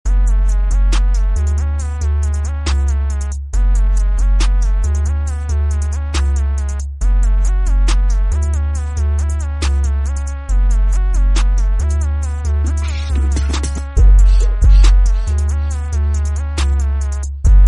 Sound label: instrumental song